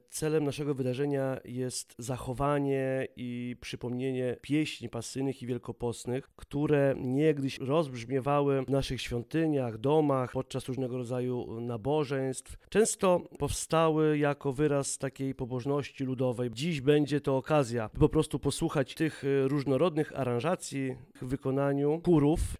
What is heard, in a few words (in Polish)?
koncert pasyjny; w korytkowie duzym przypomna piesni pasyjne i pokutne